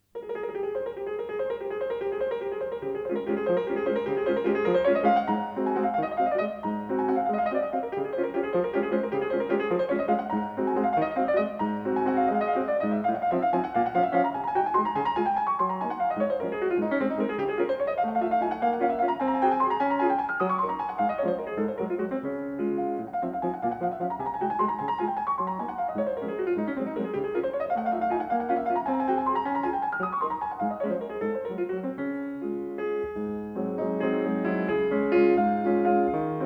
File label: Waltzes, No. 1 in D flat major - Molto vivace, Piano; Chopin